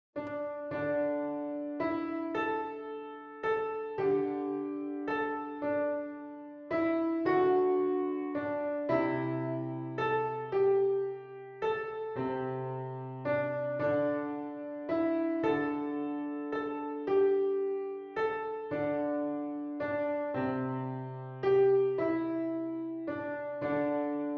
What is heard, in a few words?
easy piano; taladh chriosda